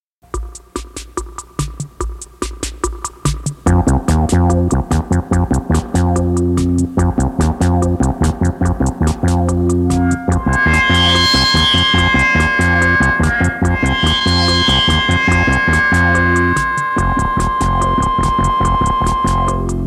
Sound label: Moog Rock